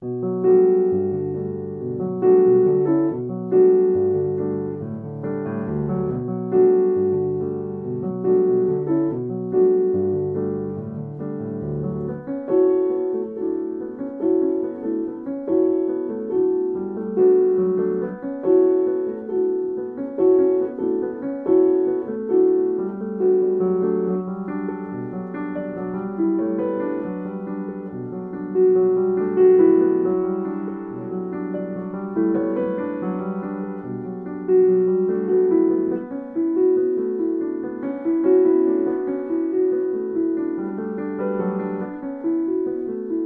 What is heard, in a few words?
Solo Piano